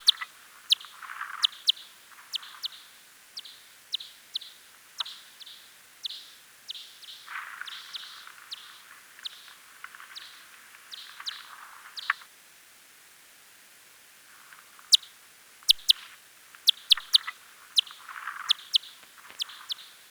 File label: Myotis macropus